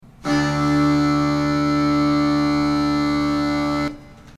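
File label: drones, Uilleann Pipes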